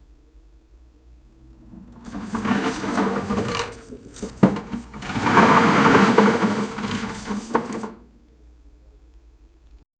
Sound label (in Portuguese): sons de como se estivesse, haunted house